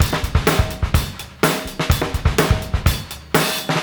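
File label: 125 bpm, beat loops; Zero-G; Monster Beats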